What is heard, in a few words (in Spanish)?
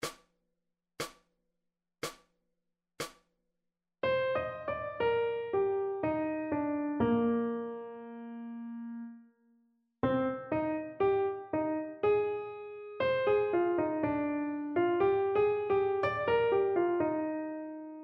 Transcripcion Melodica Harmonica; jazz i moderna